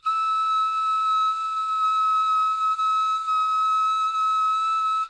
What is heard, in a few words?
Flute